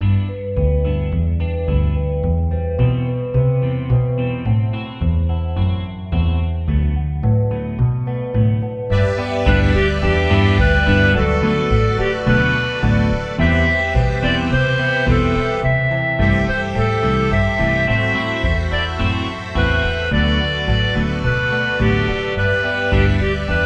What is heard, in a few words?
citrus pan bossa